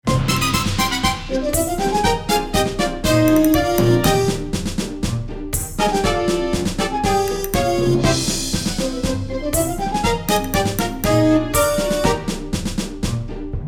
Orchestral, XR XV

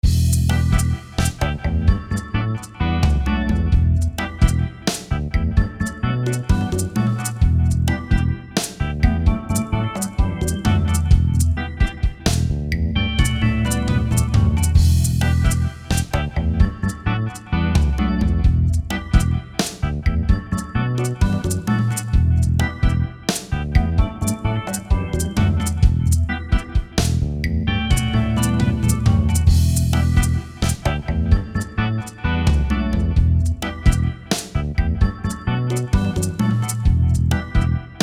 MT-32 Groove SRX

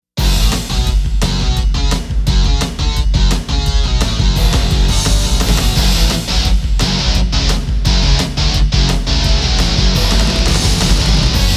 SRX; Fantom XR; Duke Nukem 3D – Grabbag

